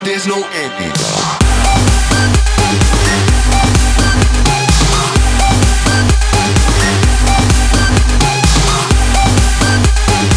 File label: Swedish House